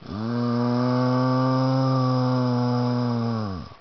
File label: zombie idle